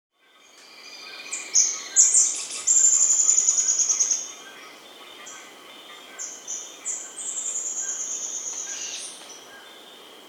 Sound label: Roraiman Flycatcher (Myiophobus Rora; S. America